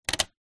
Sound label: cassette vhs top flap open close